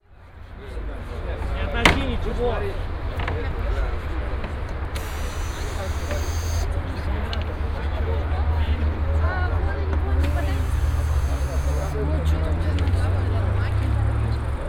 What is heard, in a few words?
stage dismantled; sergiyev posad